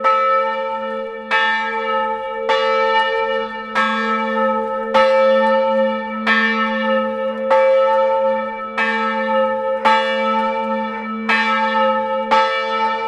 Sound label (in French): cloches